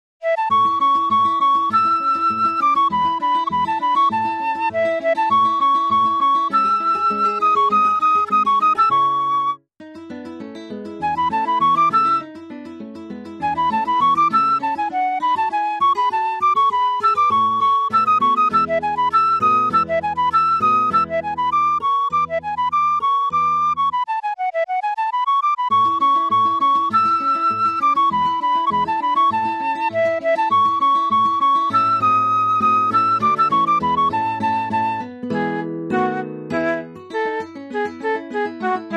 trois petit duos; Carulli Ferdinando

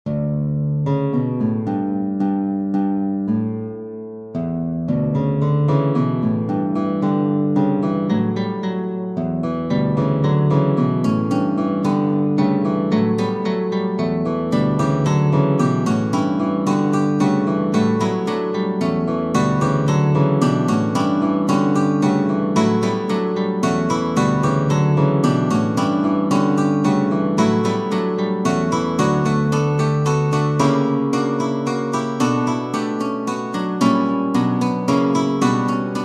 petit quintet guitare pour enfants

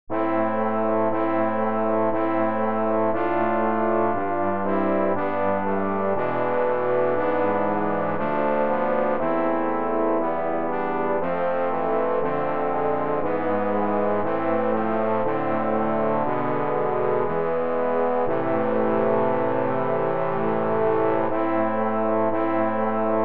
quatuor pour trombone

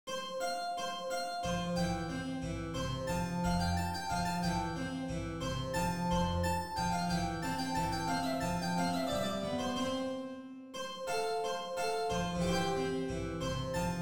gavotte majeur; corelli arcangelo

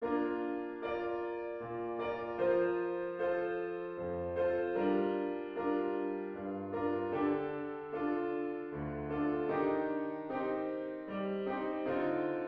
sarabande sib majeur

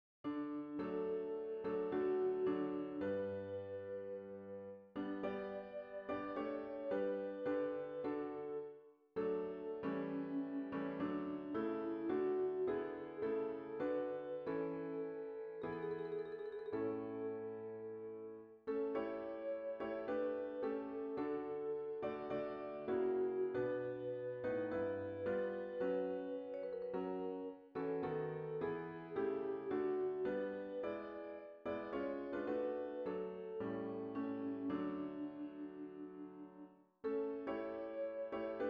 hymne national danemark; kra yer hans ernst

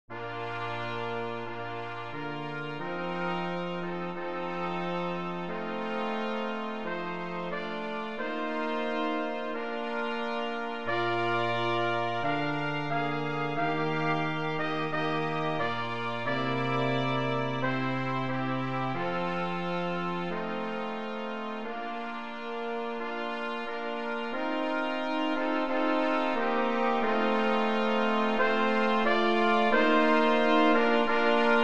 trompettes trombone tuba; mozart wolfgang amadeus; qui presso

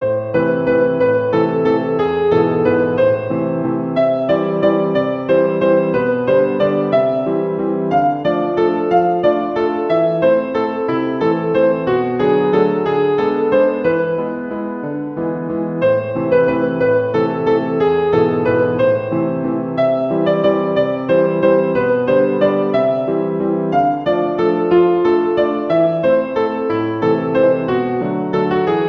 valse

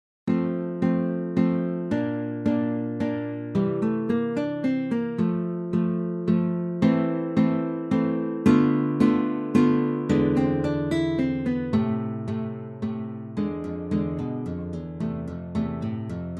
menuet

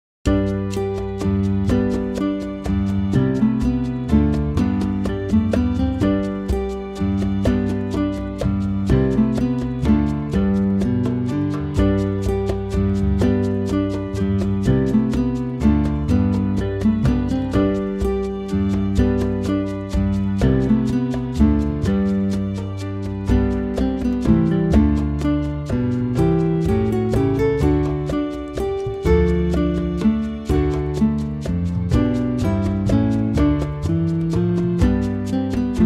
menuet sol majeur

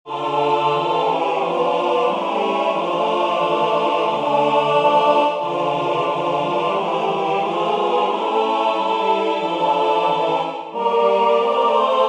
chanson automne

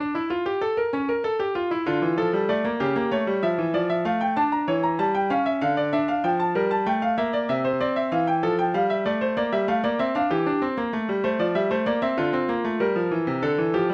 invention pour piano; Johann Sebastian Bach; 4 bwv 775

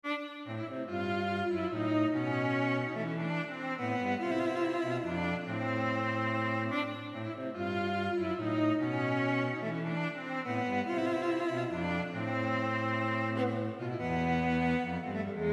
bergerette pour alto et violoncelle

